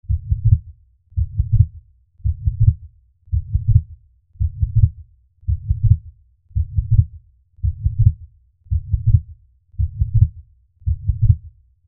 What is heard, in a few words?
MedicalScientific; Soundfx III